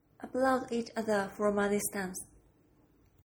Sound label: Applaud each other